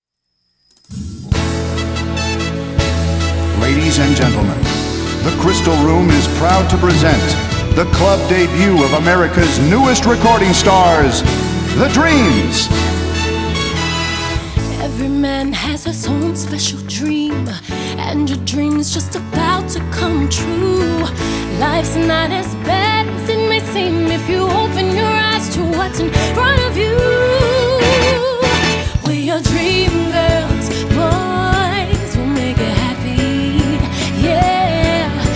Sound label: vocal